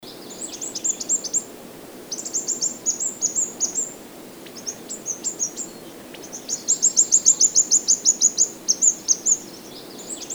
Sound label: suara firetailed sunbird